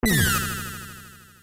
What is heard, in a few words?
blaster; ff3; ff3us